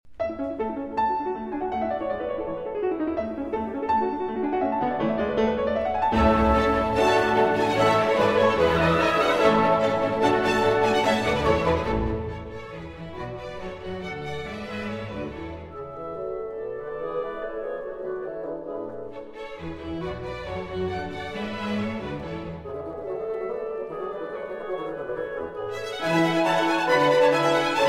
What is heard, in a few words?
Piano Concerto No. 23 in A Major, K. 488 III. Allegro Assai; Mozart